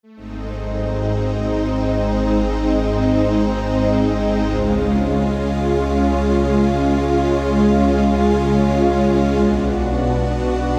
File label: Warm Pad; Sonic Reality Modern Songwriter